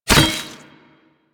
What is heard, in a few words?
Inf Boomerang Catch A; Warframe; Weapons; Infested